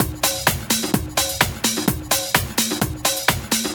House Loop, 128 BPM; Vengeance Samples

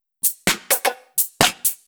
Electro Loop 128BPM; Vengeance Samples

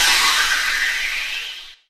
FX Downlifter

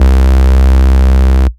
Ultimate Bass Root C; Vengeance Samples

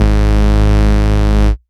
Bass, Root G#; Vengeance Samples

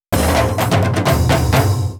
Fill 128 BPM; Vengeance Samples; VEH1 Loops

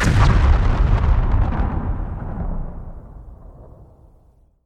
Hits & Explode

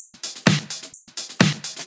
House loops 128BPM; Vengeance Samples

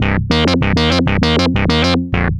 ARP Odyssey, Arp A Line, synth samples; musicradar-synth-samples

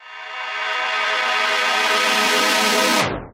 Tonal FX G#

